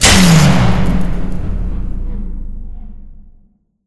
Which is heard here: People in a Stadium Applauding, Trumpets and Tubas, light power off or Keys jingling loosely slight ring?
light power off